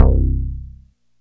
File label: JUNO SEQ, Juno Synths; E-MU Formula 4000 Series Vol. 3; Analog Odyssey